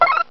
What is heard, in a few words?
Button Click; Phrozen Flame